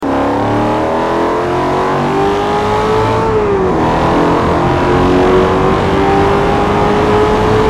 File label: second, vehicles; s5; TDMCarpack; TDMCars